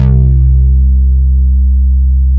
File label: ANALO BAS, ANAL BASS